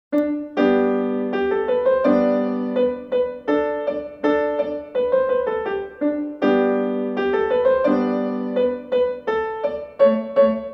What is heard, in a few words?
Hunters Chorus piano